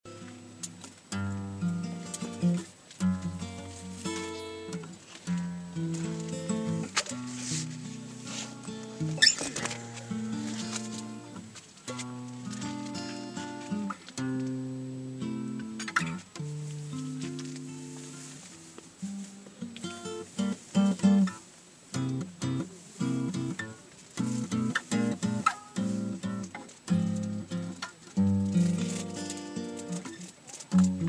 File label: guitar; crimea